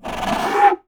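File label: JAPANESE FL, BAMBOO FLUTE; Voice Crystal - Steve Reid Definitive Percussion; ERIE FILM-Q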